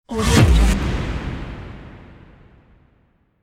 asteroid boom

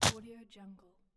organic horror interface fleshy button

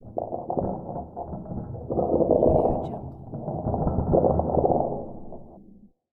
underwater heavy chain drag

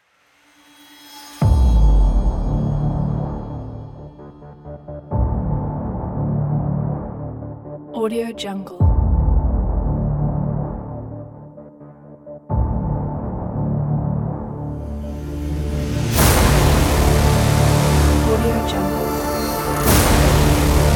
agressive teaser; cleanmindsounds

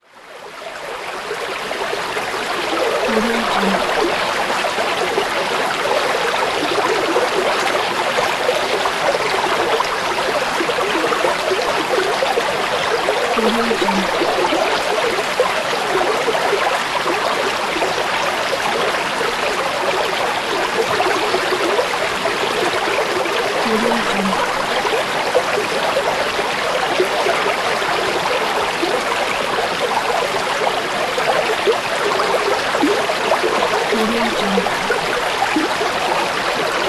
running water

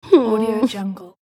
child whine

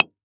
pick axe rock

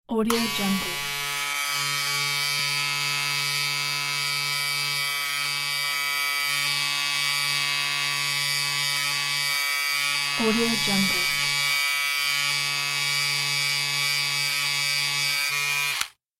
hair clipper

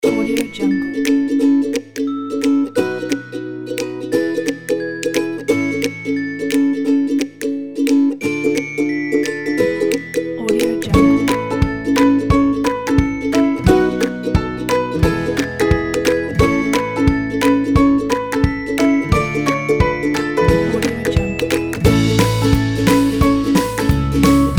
happy

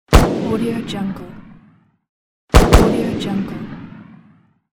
pistol shot

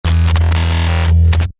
sinematic source recordings synth bassy electric; soundmorph

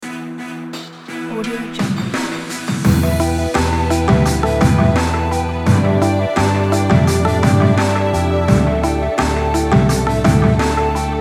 War loop

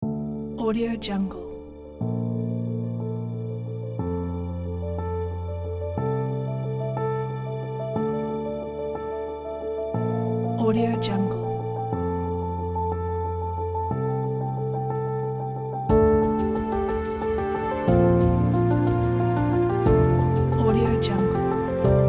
epic piano

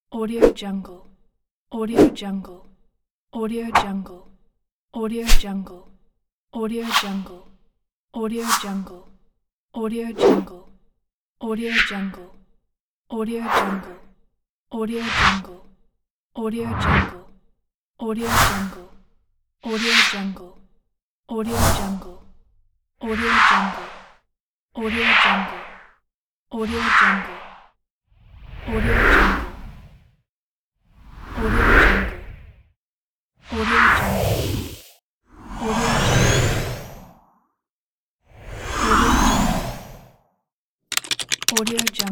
slideshow sound effects pack

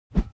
foley whoosh bamboo slow; smartsoundfx

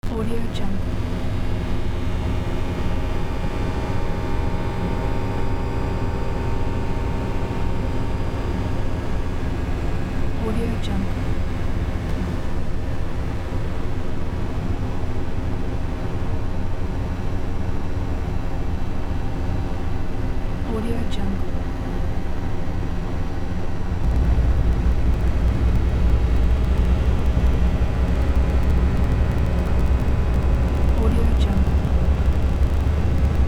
creepy noise drone

